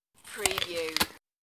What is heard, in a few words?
Biting Apple